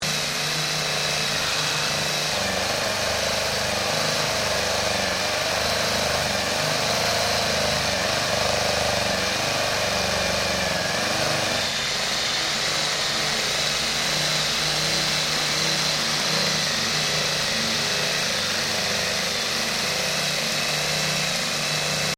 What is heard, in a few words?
MACHINE SMALL GRINDING LOOP; Created mechanical noises; Machinery (Sound Effects:Machines)